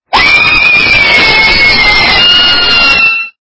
banshee scream

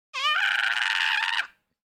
drowns

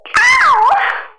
awwww

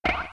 spring

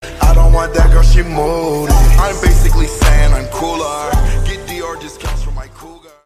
slowed reverb

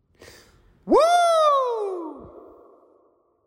whoooo

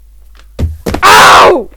punch scream

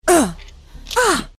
sigh

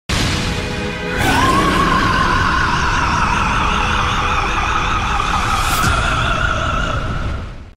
victory roar

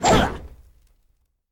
homing attack; sonic

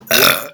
burp

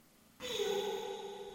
pew pew

